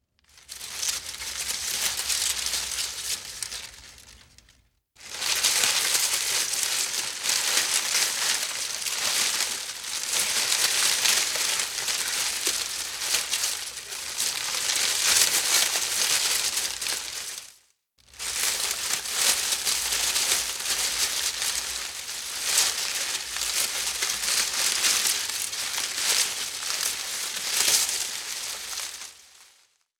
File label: Foley Footstep Human Walk Through Cornstalk; Foley Footsteps